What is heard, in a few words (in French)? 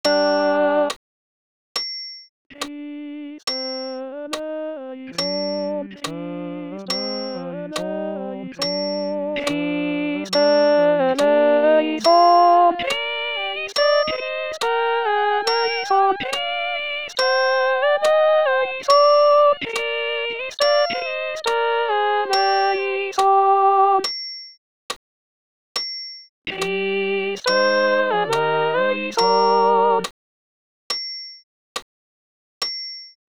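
Christe alto voix accompagnement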